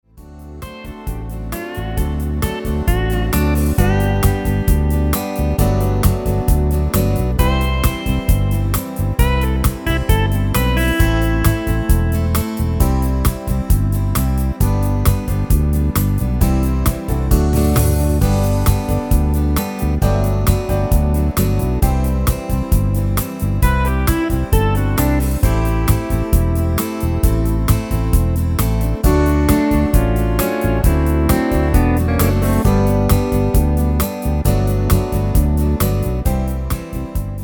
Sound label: Without Lead voice, backing tracks; backing tracks tyros5